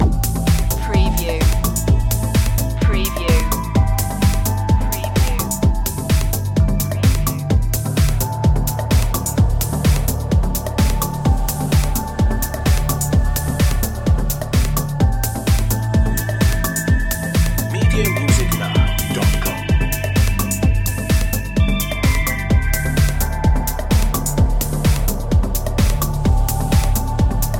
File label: progressive boy loop